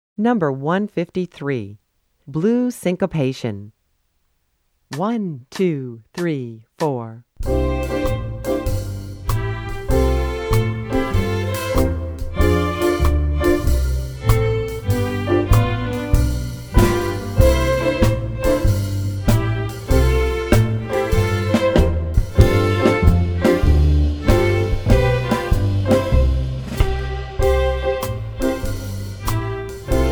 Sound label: Blues Syncopation